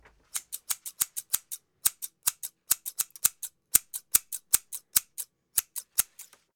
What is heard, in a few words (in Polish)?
Nozyczki Puste, scissors